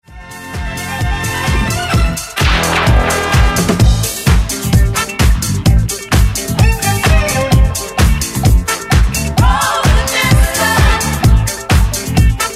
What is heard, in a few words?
Roller Disco